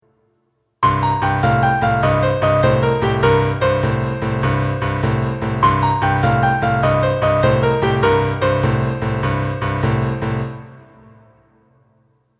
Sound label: shuffle